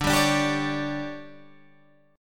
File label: D Major 7th Suspended 4th, Guitar, Open G